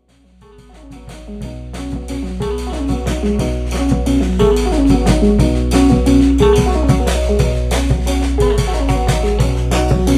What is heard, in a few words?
Samba